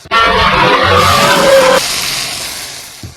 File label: jumpscare